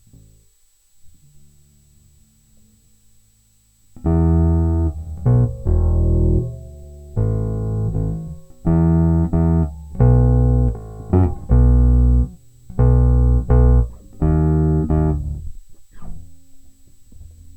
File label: bus noise